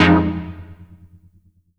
Stab Motor Fmin7

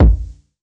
Kick; Ableton Live 12 Lite; Core Library; Drums

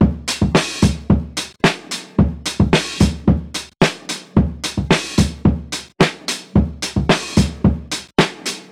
Soul Funk No Dice 110 bpm, drums; Loops; Ableton Live 12 Lite; Core Library

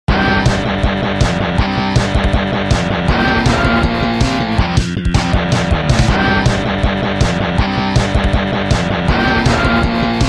Music Cave; Megaman Flash

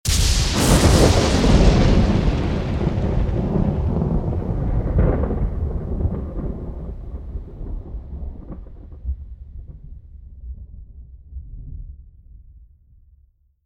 Lightning Thunder 1 shot; Journey

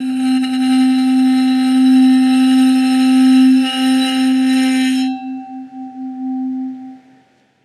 Bowed Lids; Loops; Texture; Ableton Live 12 Lite; Core Library

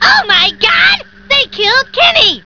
got hit; Digital Paintball 2

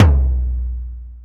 Tom DMX Low; Drums

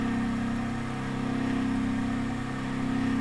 Machine Noise Ambient; Loops; Texture; Ableton Live 12 Lite; Core Library

